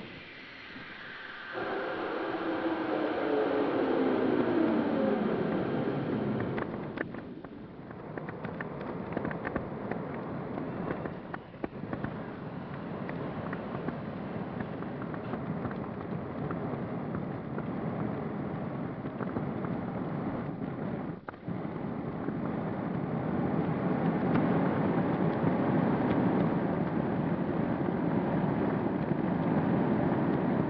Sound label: ice breaking